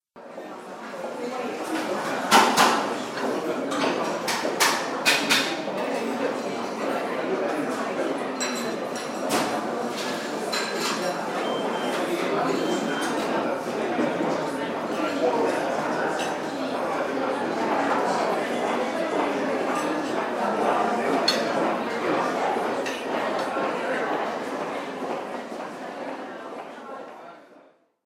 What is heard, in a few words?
Hotel Foyer Ambience And Footsteps; Building Sound Effects; Andrew Fleming